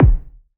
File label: BD, BASS DRUMS; EdgeSounds